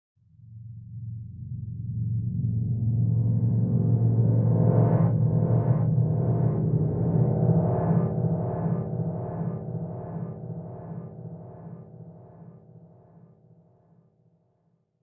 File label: Weird Loop; Insanely Twisted Shadow Planet